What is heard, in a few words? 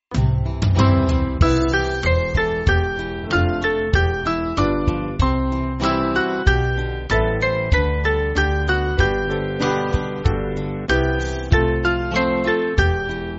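Band, Music Backing Files for Churches; My God I Know; Warwick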